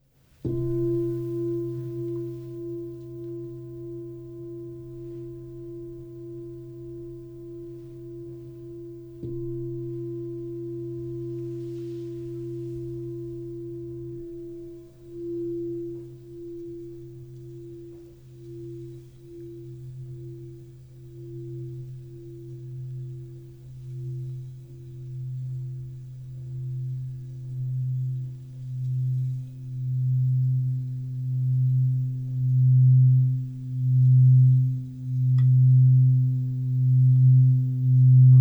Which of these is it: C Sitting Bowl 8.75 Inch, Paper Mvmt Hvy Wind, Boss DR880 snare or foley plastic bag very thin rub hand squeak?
C Sitting Bowl 8.75 Inch